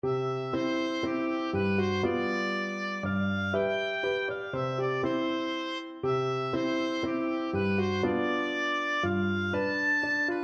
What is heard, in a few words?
bella bimba, oboe